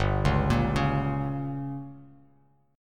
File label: G Diminished, Bass Standard; arpeggiated; JGuitar